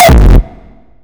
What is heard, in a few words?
rawstyle f